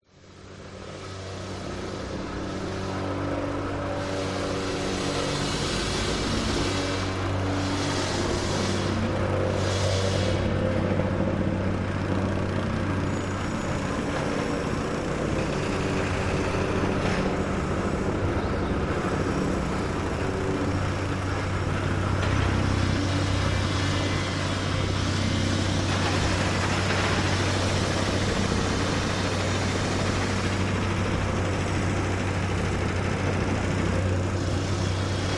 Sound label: Busy Construction Ambience; west wolf